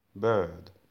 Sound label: bird